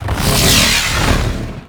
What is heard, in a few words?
Storm Cast; Warframe; Ninja